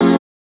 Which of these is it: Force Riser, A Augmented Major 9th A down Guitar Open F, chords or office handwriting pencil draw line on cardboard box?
chords